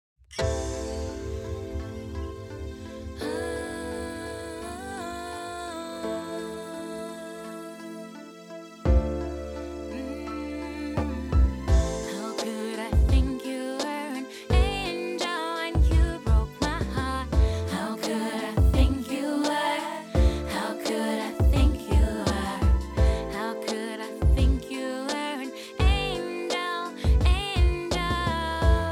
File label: mixdown eqed; eiosis aireq premium